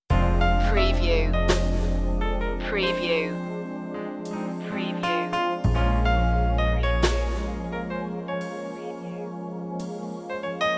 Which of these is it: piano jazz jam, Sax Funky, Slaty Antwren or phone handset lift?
piano jazz jam